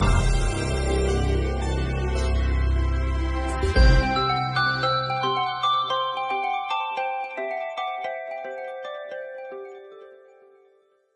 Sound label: music speed end